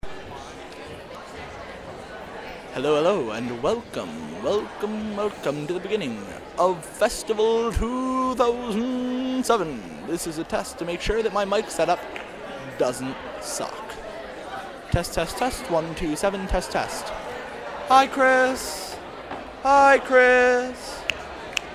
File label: instrumental